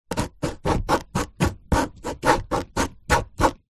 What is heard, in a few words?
office pen ball point sketch shape on paper on wood table; zapsplat